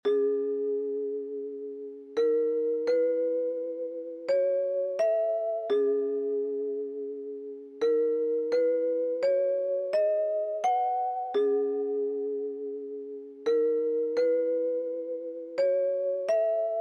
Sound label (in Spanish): brindis instrumental